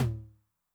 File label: Mid Tom Noise, 909